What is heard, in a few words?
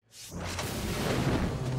exploding pow; Pipers